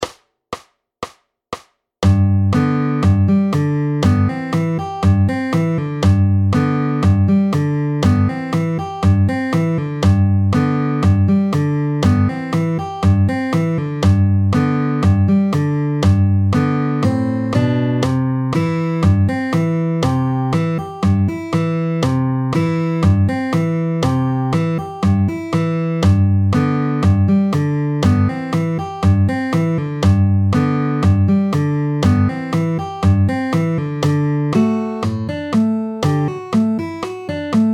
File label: Folk Fingerstyle Practice Routine; 1960's Folk Fingerstyle Tricks